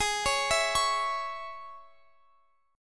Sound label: Ab Suspended 4th Sharp 5th, Guitar, Open F; Absus4#5; arpeggiated; chordshape; x,x,20,20,19,20